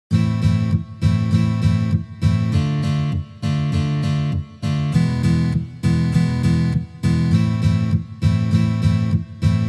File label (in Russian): Prostoj gitarnyj shtrih s glusheniem